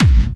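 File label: BD Clubby, bass drum; VEC1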